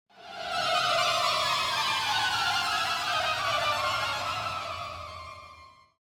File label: Symphonic Pad Going Down Pad - Running Down Violins; Hollywood Edge; sound designer's toolkit v2